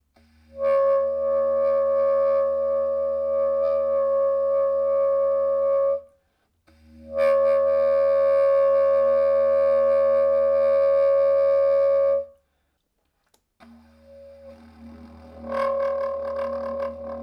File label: with slap and flutter